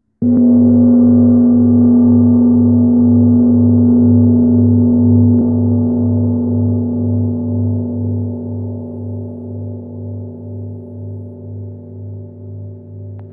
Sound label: Feng Gong